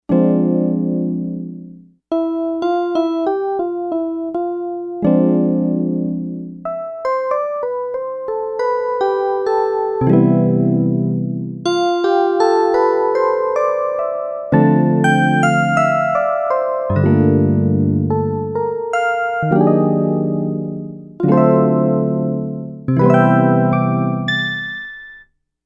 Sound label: New Celeste